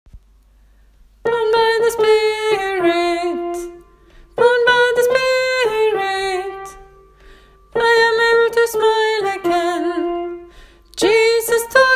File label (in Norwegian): born again sopran; åfjord